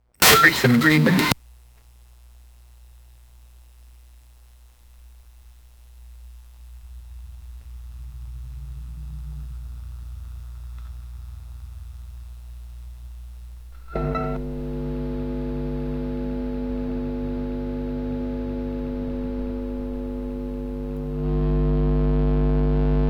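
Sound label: futuristic cannon charging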